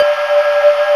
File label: BELL HALL, ENSONIQ VFX; Rubber Chicken Software Sample Bank